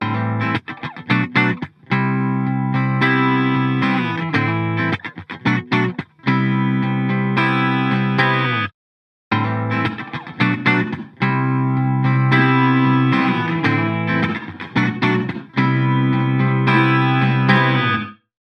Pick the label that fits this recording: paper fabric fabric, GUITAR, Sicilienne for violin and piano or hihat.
GUITAR